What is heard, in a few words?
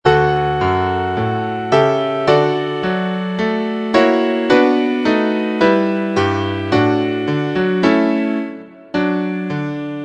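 accompaniments, Trumpet in D; concone; Rudimentary Transposition Studies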